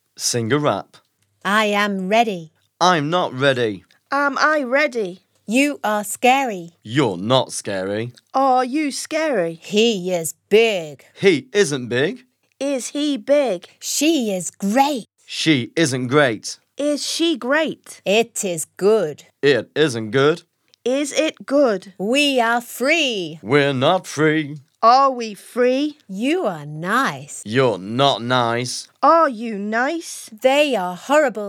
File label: Rap song